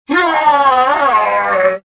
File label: death2